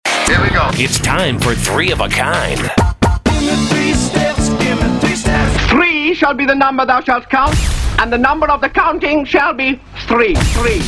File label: Classic Hits, Female